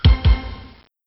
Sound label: tictoc